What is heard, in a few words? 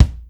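bass drum snap; 300 Drum Machines; Yamaha Motif ES-Series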